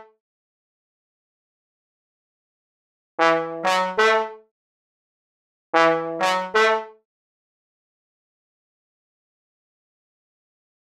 reggae

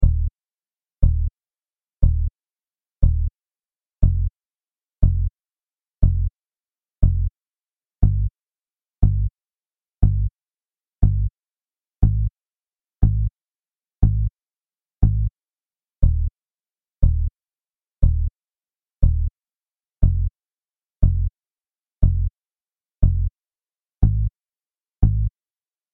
C 120 Elec GTR solo chords

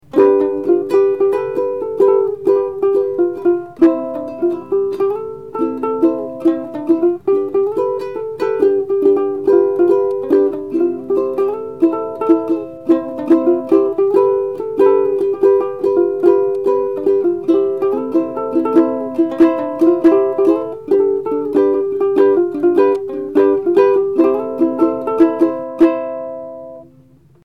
Uke Blended Samples

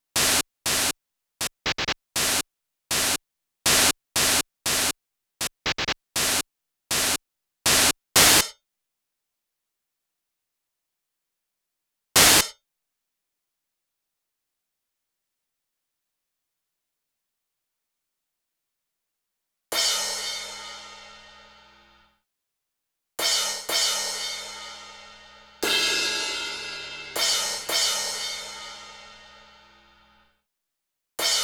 Cymbals & white noise 120bpm

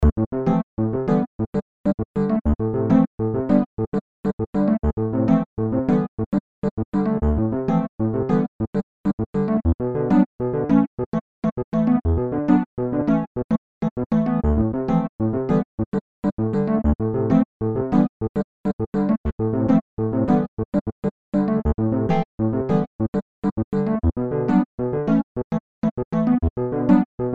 e piano loops

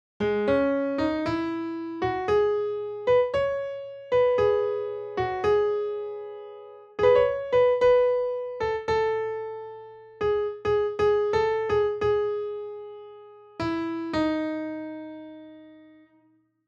piano melo

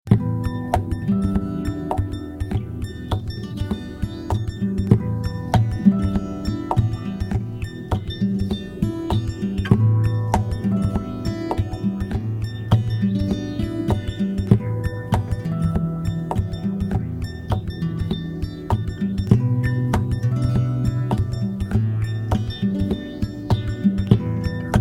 Em 100 GTR Groove

